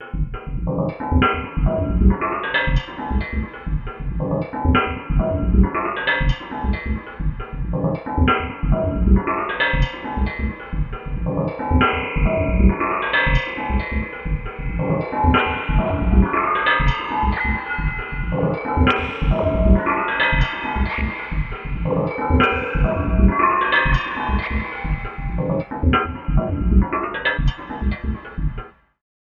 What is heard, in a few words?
Clocky Riff; Zero-G - Ghost In The Machine